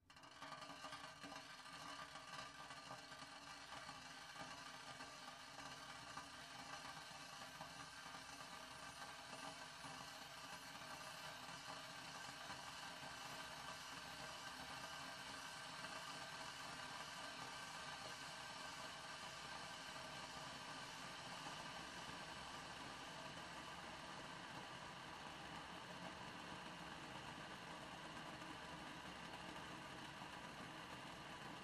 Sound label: Meat Grinder distant